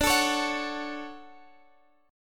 D-Major 7th D down Guitar Standard; DM7; strummed; capo=2

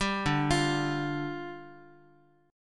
D Minor Double Flat 5th, down, Guitar; Dmbb5; arpeggiated; JGuitar

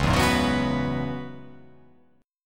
C sharp Minor 11th C sharp

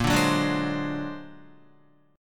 A sharp Augmented 9th A sharp Guitar; A#+9 strummed; JGuitar

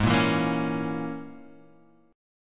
A sharp Minor 7th Flat 5th G sharp

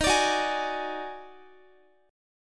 Eb Minor Major 11th, down, Guitar; strummed; 4F,4A,5C,5G,5C,6E; chord; JGuitar

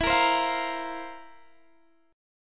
F Major 7th Suspended 4th E

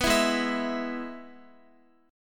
Ab Minor Sharp 5th Ab down Guitar; Abm#5; strummed

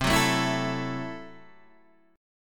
C 6th Flat 5th, C, down, Guitar, DADGAD

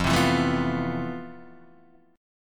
F Major Sharp 11th Guitar DADGAD, chord

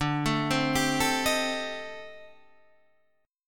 A-Minor 7th Flat 5th D, chord; Am7b5/D arpeggiated; JGuitar

